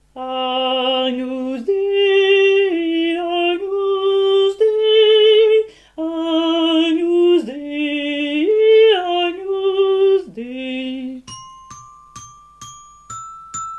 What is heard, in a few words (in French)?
basses; charpentier; agnus dei